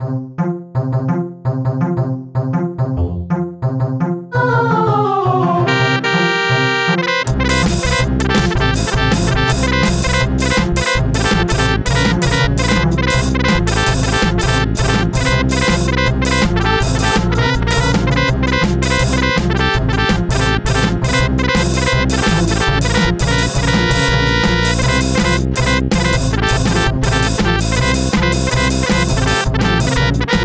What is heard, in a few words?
8 bit